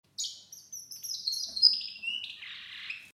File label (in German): Voegel